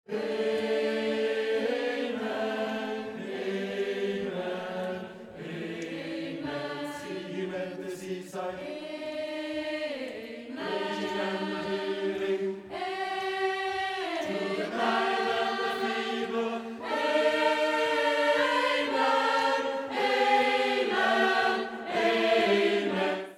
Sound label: Amen